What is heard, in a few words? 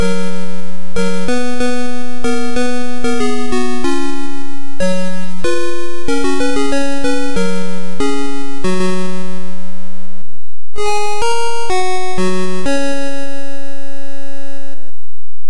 Victory Sequence Atari ST